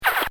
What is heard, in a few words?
Smooch; Mouth (Sound Effects:Human)